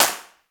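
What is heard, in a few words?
ELECTRIC snare; Greytsounds VOL-1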